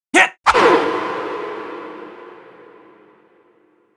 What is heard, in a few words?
Magi M Attack